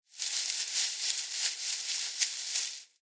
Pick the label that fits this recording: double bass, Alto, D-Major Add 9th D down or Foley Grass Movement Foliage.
Foley Grass Movement Foliage